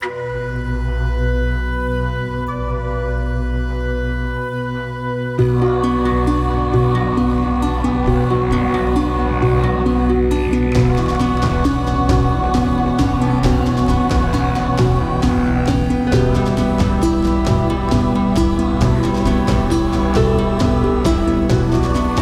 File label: Vault song